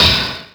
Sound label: coping hit, skater; Tony Hawk's Project 8; XBOX